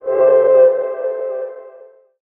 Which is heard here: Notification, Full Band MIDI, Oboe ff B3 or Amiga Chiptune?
Notification